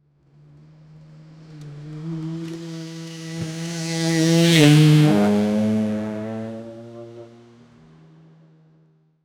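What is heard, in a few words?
loud motorbike sound passing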